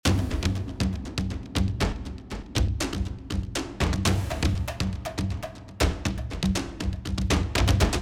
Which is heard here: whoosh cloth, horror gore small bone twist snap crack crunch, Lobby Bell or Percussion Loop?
Percussion Loop